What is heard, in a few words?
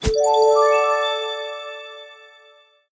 shuffle; guodongrenwu